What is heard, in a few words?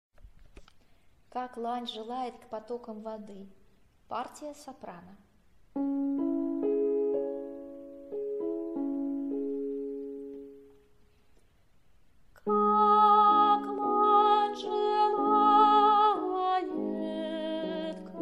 soprano